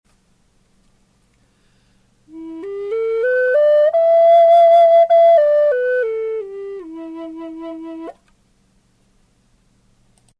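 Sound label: fin E, native american flutes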